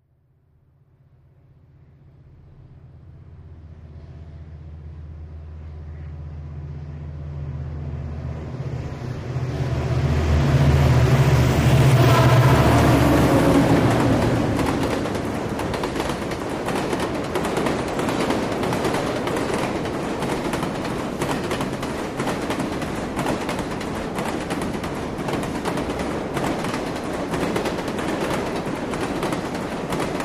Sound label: Freight Train By Fast